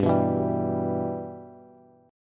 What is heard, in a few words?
G 6th, Bass; G6 strummed